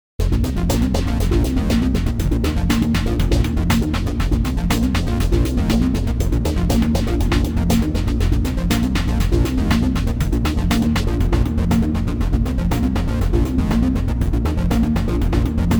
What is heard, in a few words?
phaser